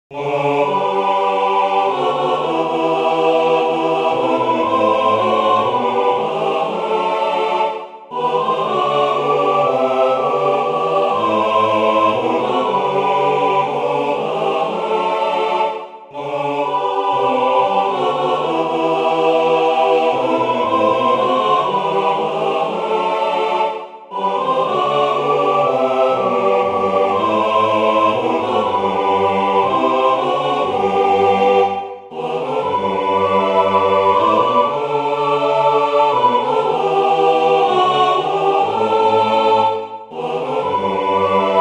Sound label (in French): Le refuge tutti